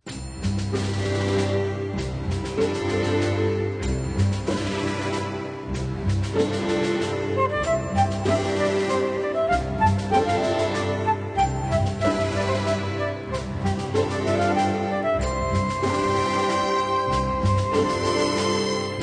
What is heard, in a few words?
Orchestra